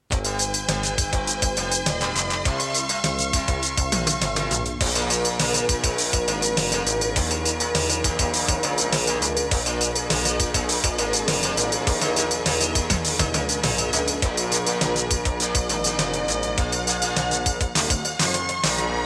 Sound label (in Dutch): Filler zonder station call; jingles radio zoniën 1982-83